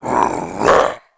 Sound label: attack, ice snow man; monster; ice snow giant man